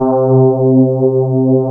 CMI ORGAN

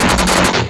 Treat Guit, Industrial Nasty

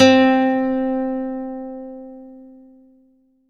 C3, CLUB ORGAN; Roland JV 2080

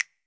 F.SNAP, FINGER POPS; AKAI S-Series CD-ROM Sound Library VOL-5

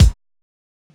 DRUM SAMPLS, Disco Fever; Best Service ProSamples vol.48 - Disco Fever; AKAI

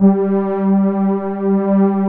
smoothies, pads; Pro-Rec - Synthsonic

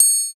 127 TRIANGLE; East West - Phat & Phunky CD2; 90's Sample CDs

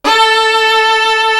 SOLO VIOLIN; East West; Global Sounds

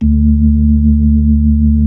B-3

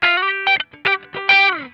GLIDE, Funk Guitar; Zero G - Funk Guitar

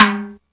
HI TIMBL; East West - Global Sounds; AFR.BRAZ

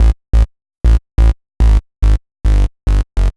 Szeet Bass A, uk garage, 142bpm, Bass; Lines n Loops

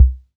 SOLT BD, Solton Disco-64

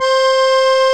TACK PIANO

